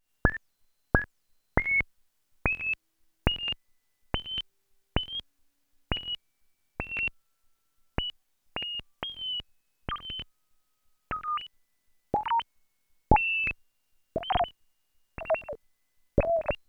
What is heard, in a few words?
App Button Radio Bloops; Developer Toolkit Pack 1